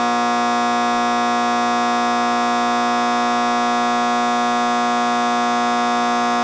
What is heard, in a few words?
ambience hum